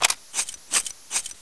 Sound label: usp attach silencer